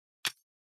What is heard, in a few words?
Ringing Katana Tsuba